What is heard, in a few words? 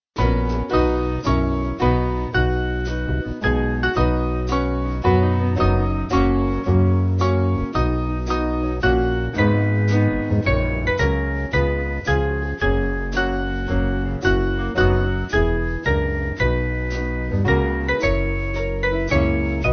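Band, Music Backing Files for Churches; Small Band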